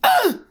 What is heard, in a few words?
crit hit; Serpentine